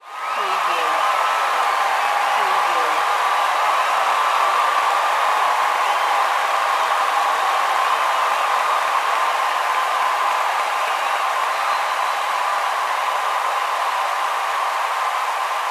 Sports Stadium Crowd Cheer Touchdown Or Goal Close Perspective